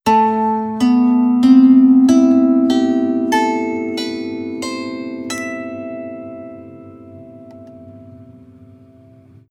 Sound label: meerklang leier improvisation sequence